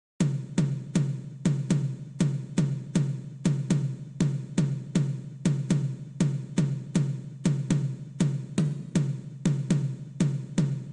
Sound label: Clave